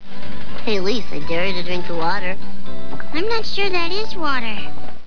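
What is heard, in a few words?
drinking water, midi; drnkwatr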